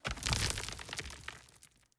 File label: Hydra Stone; Hydra Stone2